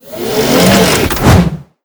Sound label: Attack